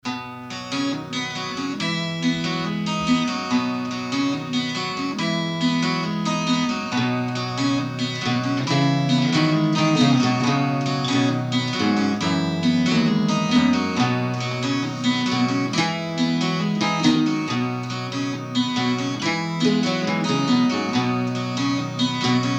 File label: instrumental